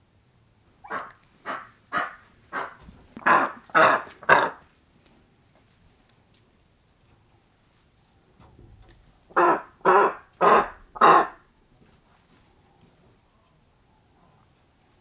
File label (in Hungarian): sasok ketrecben, parlagi sas; allathangok; termeszetben; gores tanya